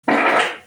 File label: loudest fart